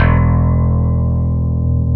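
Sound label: CP70 A2, Yamaha; East West; Global Sounds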